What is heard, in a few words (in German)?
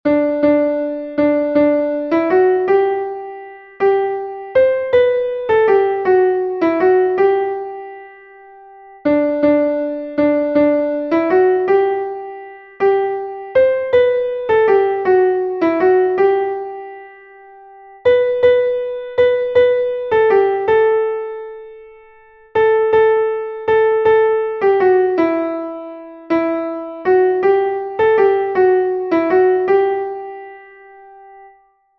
klavier; MelodieMeister